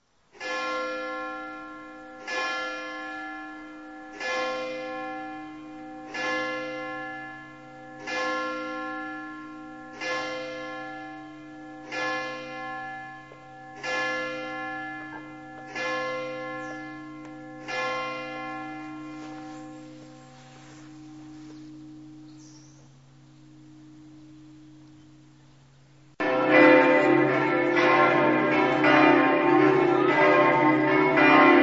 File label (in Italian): campane